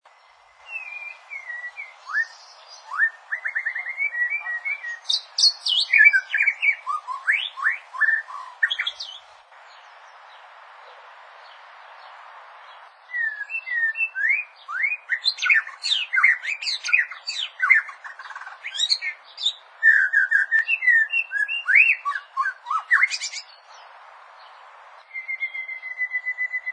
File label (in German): recht guter gesang